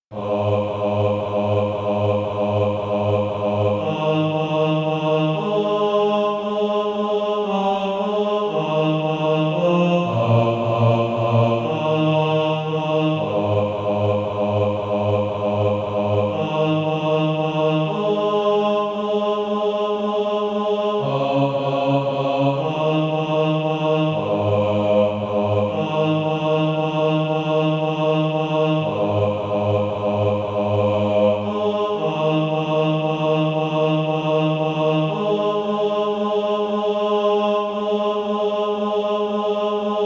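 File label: bass; SATB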